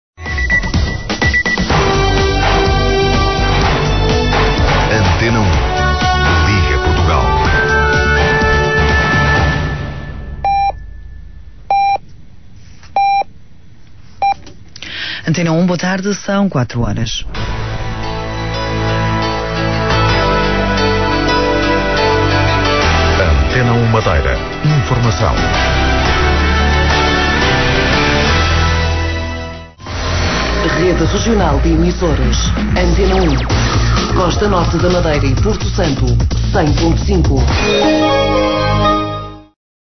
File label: interval signal; madeira